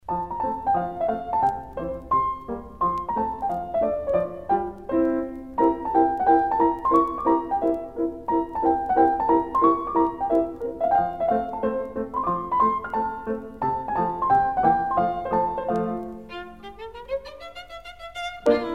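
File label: violin sonata; weber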